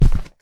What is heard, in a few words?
Footstep; backrooms 2d android ios online windows gamerip